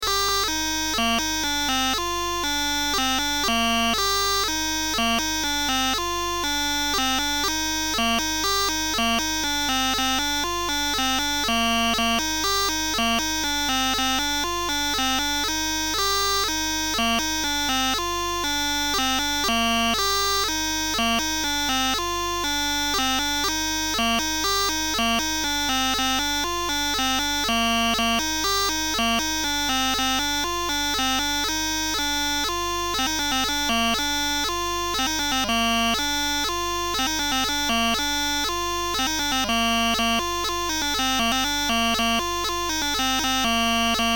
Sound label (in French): polka piquee; Polka Piquée (suite); Breizh